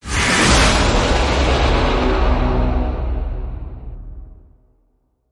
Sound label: Roll Out Hit Swell; Ninja Tracks